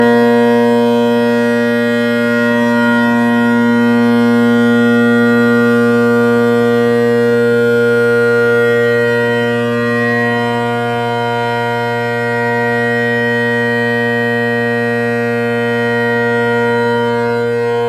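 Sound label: tenors bass, bagpipe; henderson rocket tenors; canning bass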